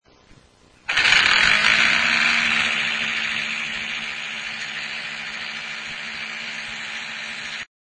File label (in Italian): Toshiba – motore degradato, loud grinding, hard disk; toshiba 60gb